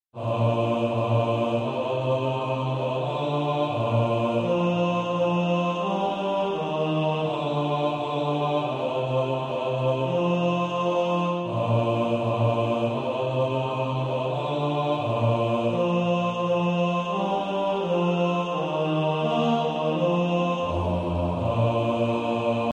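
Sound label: bass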